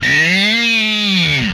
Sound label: Death, Vocal; MMRetroArcadeSoundsPack